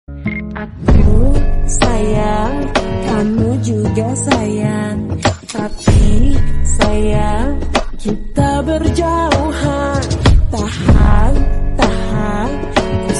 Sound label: ringtone slowed reverb